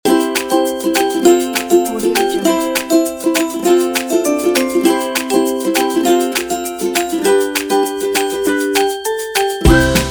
upbeat happy logo

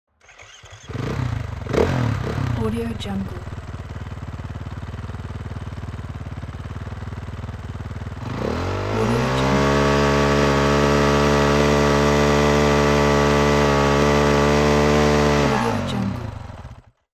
motorcycle start and revving; moonaudioproduction

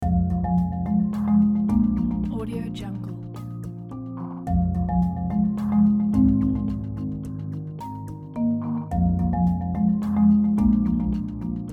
documentary voiceover background loop

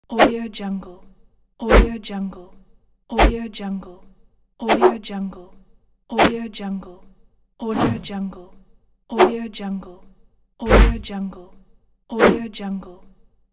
swoosh

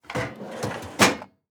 filing cabinet open; blast drive 30

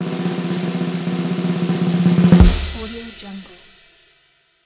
drum roll

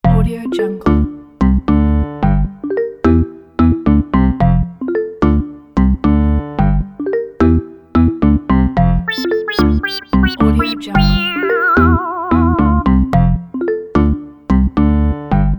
funny and jumbled logo

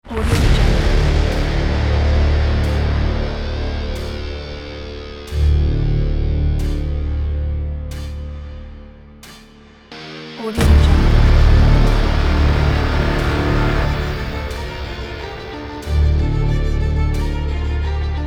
epic dramatic rock trailer

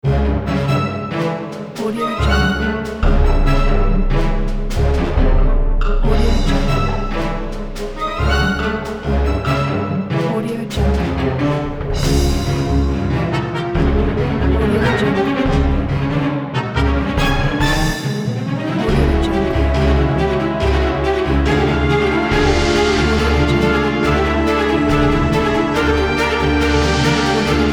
stressed action

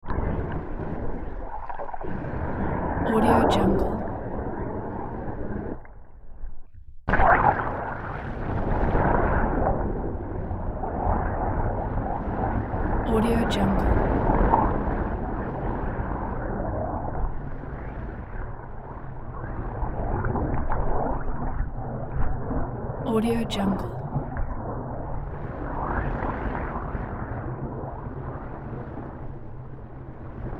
underwater turbulence

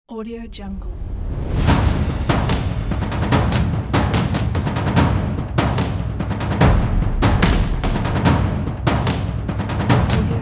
action percussion

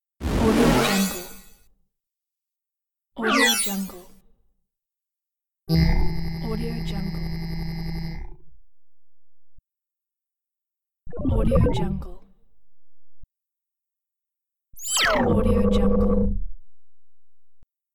scifi tech fx

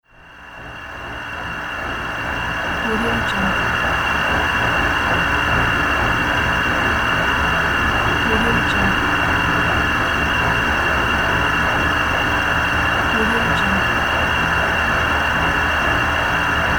ambient dark zone

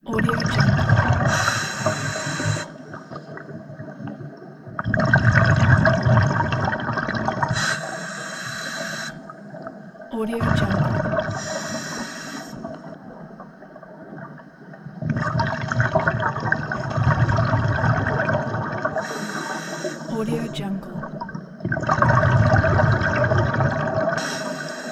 scuba diving

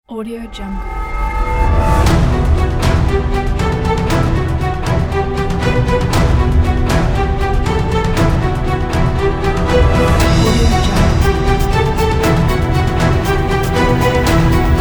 epic trailer ident pack

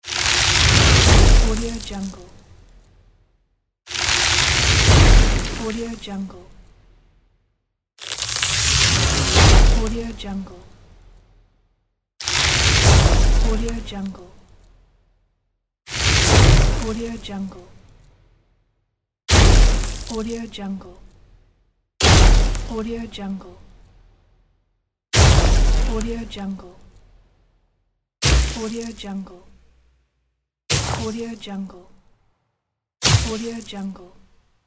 ice spell

